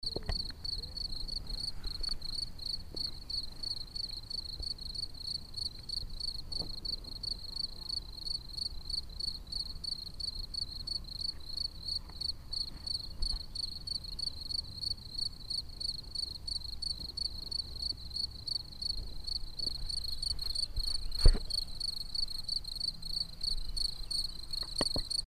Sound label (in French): grillon